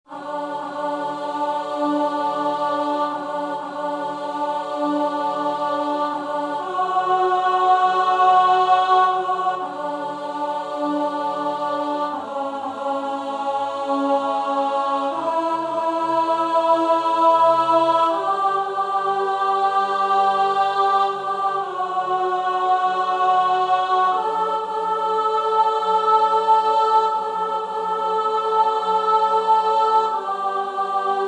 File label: Alto